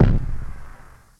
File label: kick